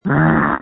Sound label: screams